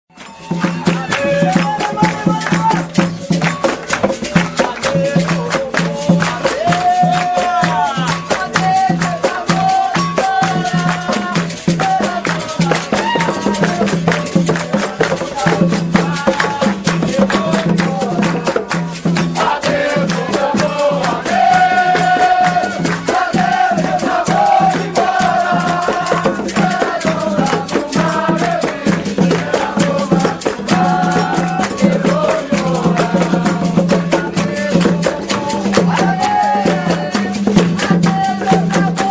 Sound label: Chant Capoeira; Adeus povo bom adeus